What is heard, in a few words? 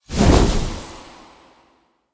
Casting Device Cast Dual; Weapons; Player; Casting Devices; Galene